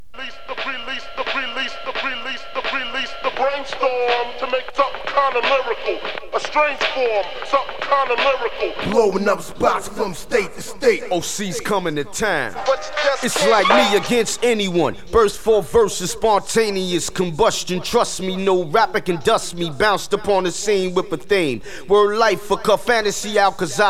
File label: accapella